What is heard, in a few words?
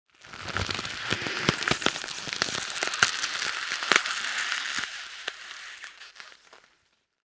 ICE Thin Grinded Squeal Friction